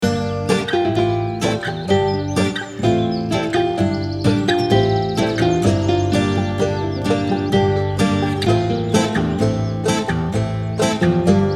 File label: Forest Tutti